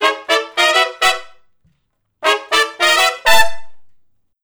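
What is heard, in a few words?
Funk Riff (A) harmony, Horncraft for R&B Sections; Crimson Blue and Fabulous Horncraft 4 RnB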